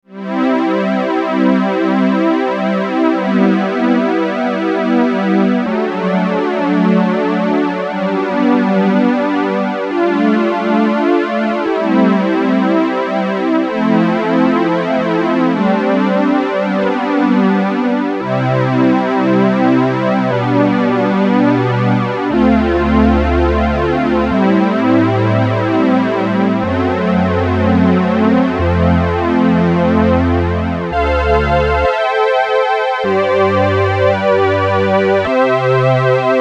Warm Pad

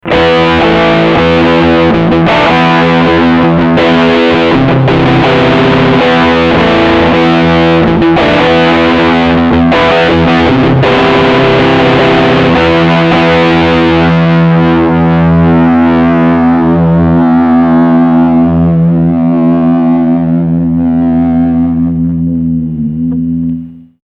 Room

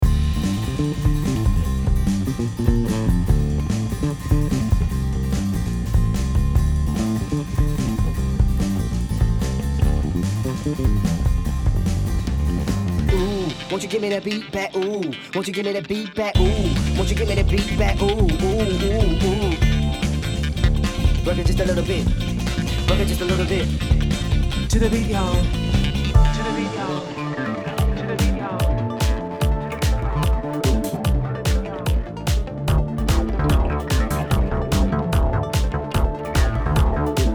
Tierra Audio Gravity VCA Mix dry

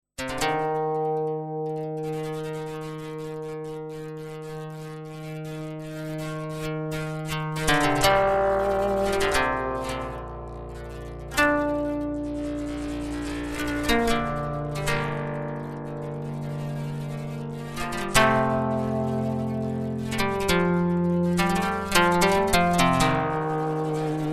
Expressive E Osmose Guitar; Karkup

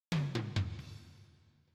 Toms Original

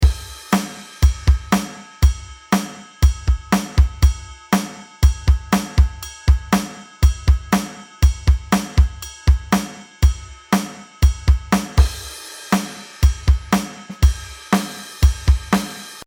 Drumbeat

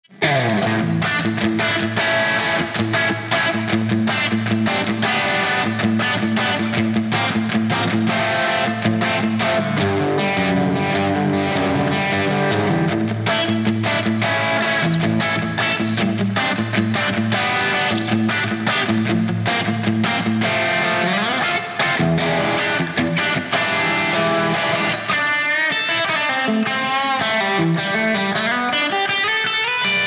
Danelectro Dirty BRIDGE

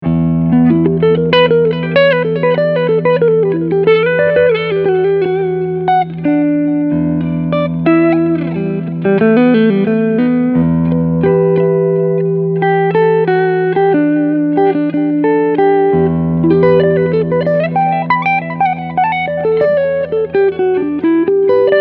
Clean Front PU

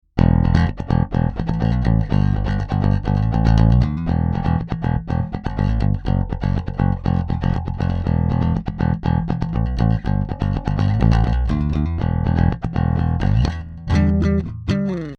Pleck Both PU

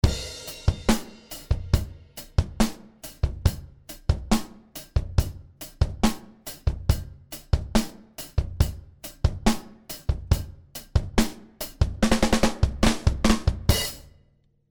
Elysia Karacter Drums Soft Turbo

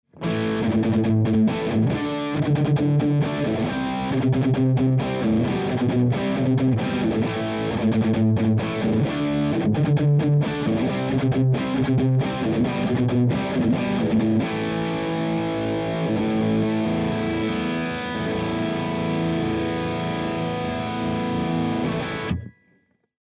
Heavy Riff Torpedo Orange 2x12

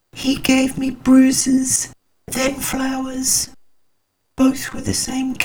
Vox Robot Vibrato Keep

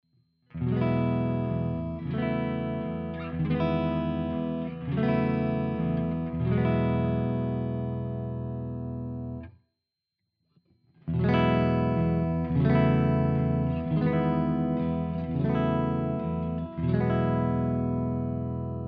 clean Chords; FRM300 PR

